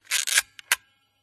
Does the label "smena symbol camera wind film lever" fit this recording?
Yes